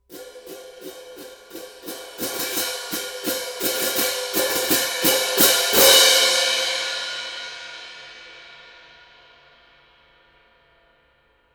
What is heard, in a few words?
18in a zildjian concert stage